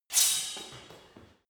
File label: sword scrape